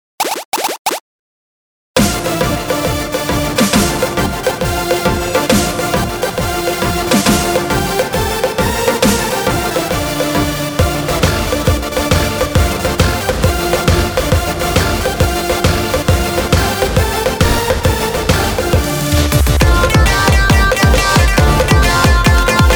דאנס; מקצבים לקורג

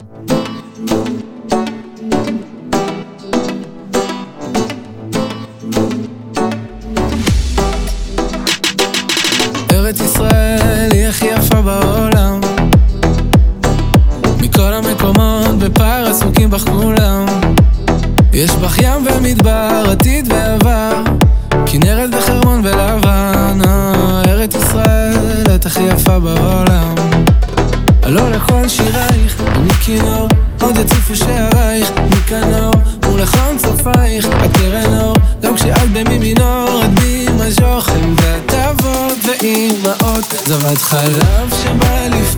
רמיקס

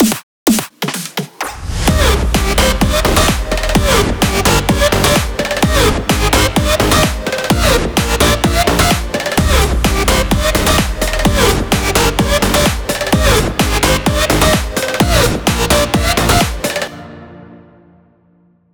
טראק

